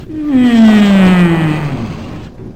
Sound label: god mode ambient; rott